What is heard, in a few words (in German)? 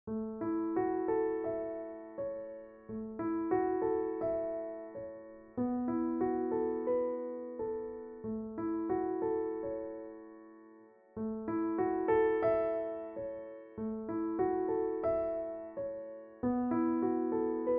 Hineinhoeren Harmonie und Melodie